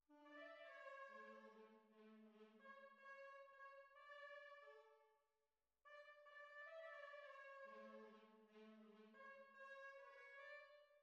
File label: Tuba, jazz for piano